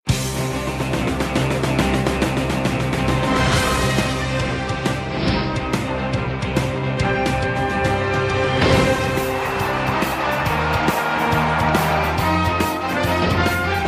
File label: Game time ring